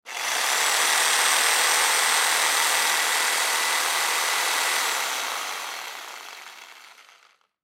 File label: Reciprocating Saw, Reciprocating Saw On Run Off; Reciprocating Saw On Run Off Craftsman; Industry; Saws